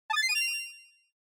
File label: SNES RPG Magic Cure